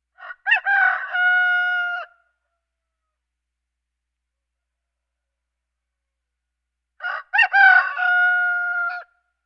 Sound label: Birds